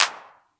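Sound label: clap, perc synth; Wizoo - Platinum 24 Electronic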